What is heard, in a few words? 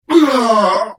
Hit; The Elder Scrolls III - Morrowind